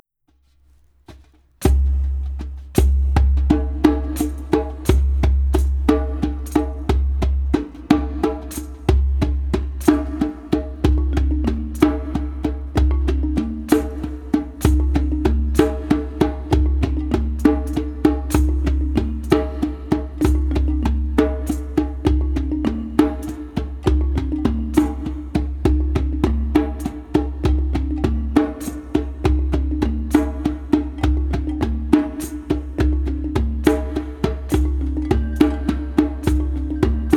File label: Shaman Beat